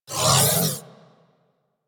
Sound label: Crewship Turret Proj By, Corpus; Warframe; Rail Jack